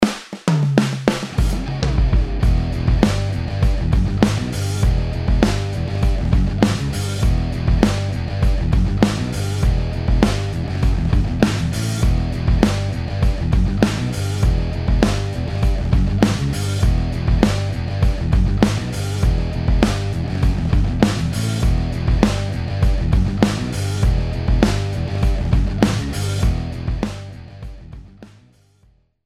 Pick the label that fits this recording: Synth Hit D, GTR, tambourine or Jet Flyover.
GTR